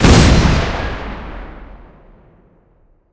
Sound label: alt fire; deepimpact